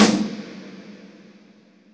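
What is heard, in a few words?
GATE snare, drum; Crypton - DrumBase5000; GATE KITS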